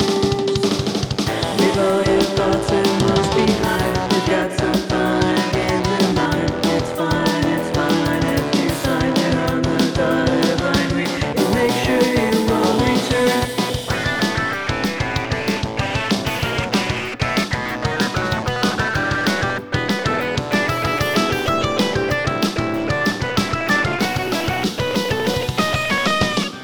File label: chorus